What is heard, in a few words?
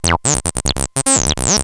saw